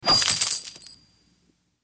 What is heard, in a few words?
Lucky coin dropped; Skill Gen Luckycoindropped